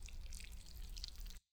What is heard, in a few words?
stylized squish; soundscrate